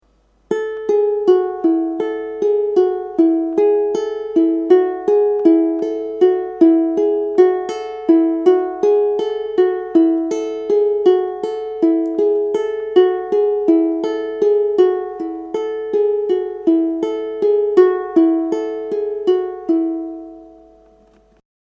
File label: Plain Hunt, ukulele